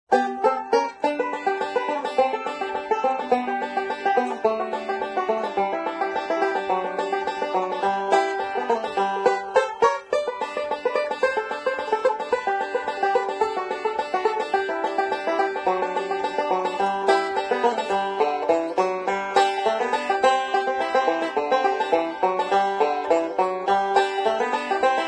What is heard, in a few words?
Banjo; Zomboid; Lifestyle